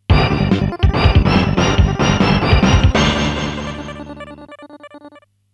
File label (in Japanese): ファンファーレ I